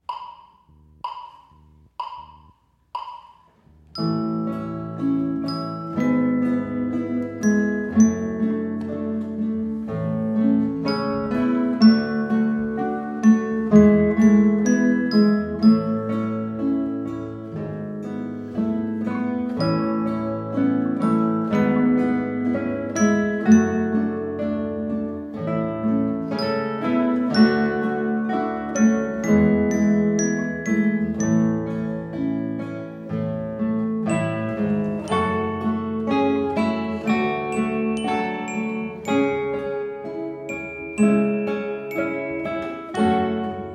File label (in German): Satz mit Glockenspiel